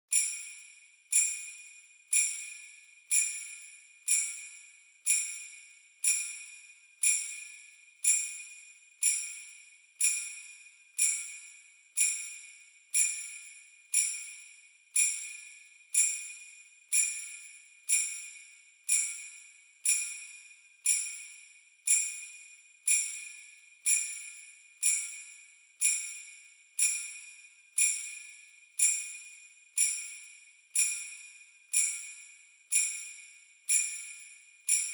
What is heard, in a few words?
Sleigh Bells Knock Hard